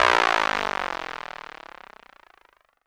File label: Dance Floor Bass, Multisample Basses